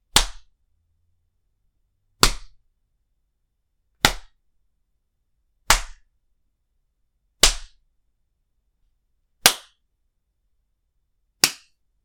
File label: Various Smacks